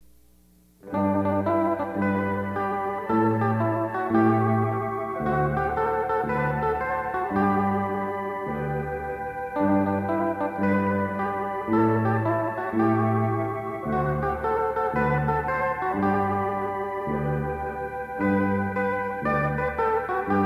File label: ring bell